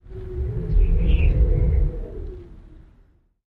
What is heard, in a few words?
wind gust; ambiences